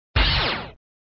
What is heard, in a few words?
Sound SFX, flipper; sttng